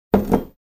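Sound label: placed on a table with sliding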